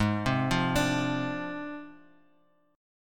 Ab 6th Flat 5th Ab, Bass Standard; arpeggiated; JGuitar

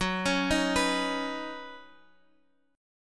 F sharp Suspended 6th; F#sus6 arpeggiated; chord shape

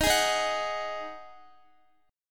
Eb Minor Double Flat 5th, Guitar chord; JGuitar

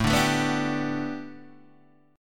A 7th Suspended 2nd Suspended 4th Guitar Open D

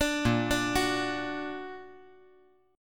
A sharp Augmented; A#+ arpeggiated; JGuitar

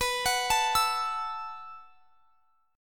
B 7th Suspended 4th B down; B7sus4; arpeggiated

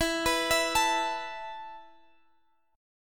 E Suspended 4th E, down, Guitar, Standard; Esus4; arpeggiated; capo=3

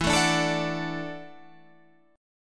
E 9th Suspended 4th E down Guitar Standard; E9sus4 strummed; JGuitar

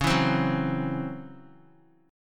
Db Minor Major 7th Double Flat 5th Db down Guitar; strummed; DbmM7bb5; JGuitar

